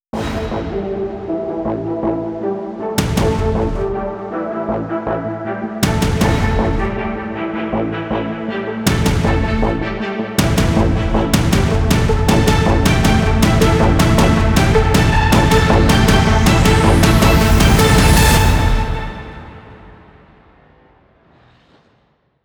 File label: tension; By Burak Sound Bank; tension gerilim vol 21